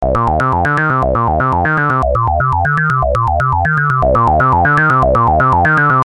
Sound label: res seq